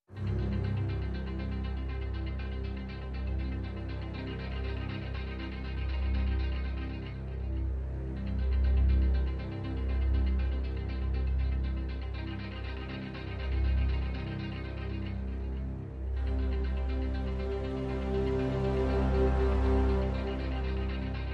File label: No Perc